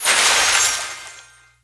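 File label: umbrella, impact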